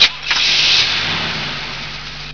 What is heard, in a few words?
carstart